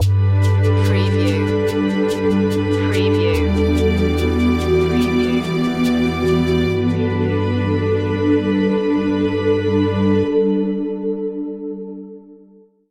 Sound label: square drops stinger